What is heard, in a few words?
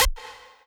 lead